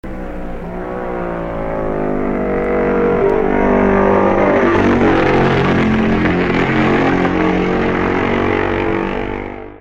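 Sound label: Norton, motorcycle; 1964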